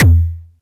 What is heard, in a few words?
Kicks, Elektron MachineDrum SPS1 MKII